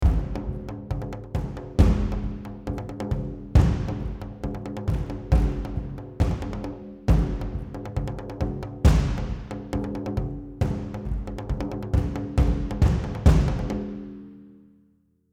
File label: Perc